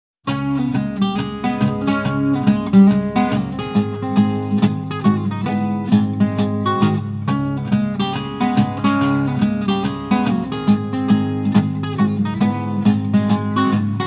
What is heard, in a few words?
Blues